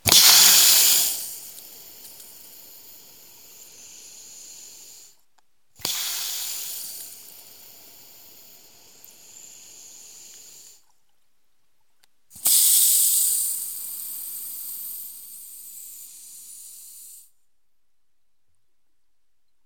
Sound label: Office Bathroom Urinal Flusher Hydraulic Steam